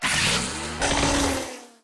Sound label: Obstacle Racing car; hello kitty friends match; android